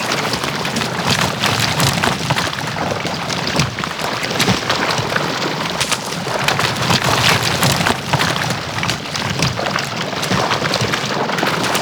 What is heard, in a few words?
Drill Debris Loop; Venus Open World; Objective Rooms; Con Site